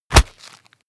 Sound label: punch low smack hit